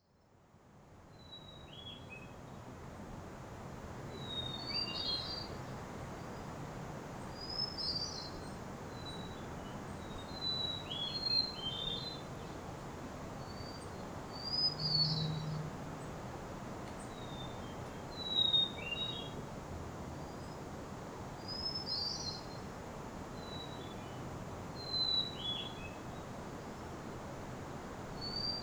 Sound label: Ucluelet Lighthouse Hermit Thrush